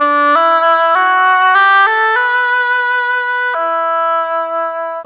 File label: Oboe, Orchestral, Rock Pop